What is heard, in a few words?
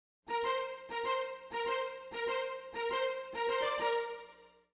Smooth Groove Strings, 70s Analog Funk